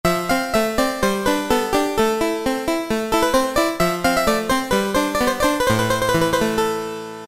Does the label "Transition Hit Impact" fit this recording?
No